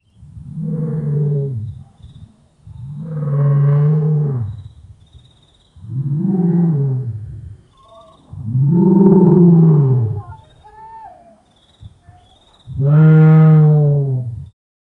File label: a lion roars at the, savannah